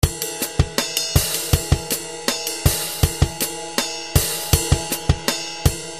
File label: Ride On Bass